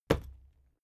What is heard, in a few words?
fight impact sweetener solid